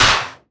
clap vintage

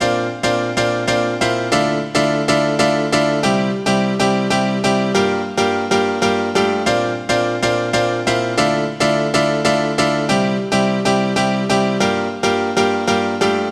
Piano, 140

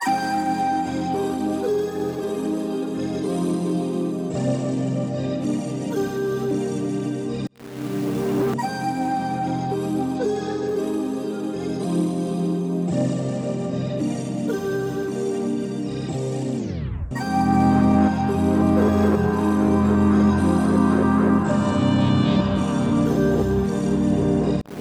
Pads 112BPM Synth Soft

